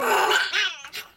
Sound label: Roaring